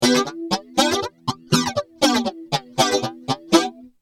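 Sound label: rhythm guitar, ADA flanger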